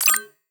UI, HUD, Short, Terminal, Beep, Lofi, Scifi, Menu, Action; PMSFX; Reto